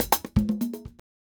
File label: Bongo item place; FREE SFX PACK VOL 2